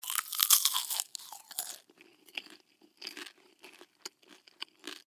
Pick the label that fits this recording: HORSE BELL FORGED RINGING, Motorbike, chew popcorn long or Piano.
chew popcorn long